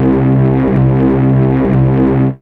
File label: DD SYN, Disco House